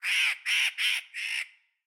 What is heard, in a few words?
crow; the wolfs night